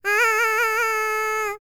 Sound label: MACEDONIAN, World Voices